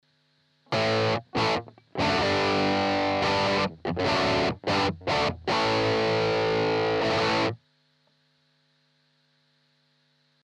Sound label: EHX 5150 Clean Overdrive Strat DI Out